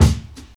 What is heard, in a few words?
Kk, Kicks